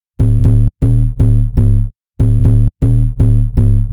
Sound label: smooth bass processed; kern audio